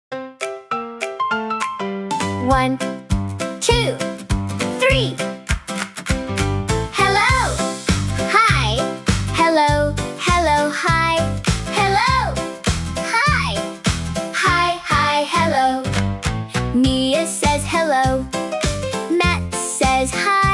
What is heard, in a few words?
hello song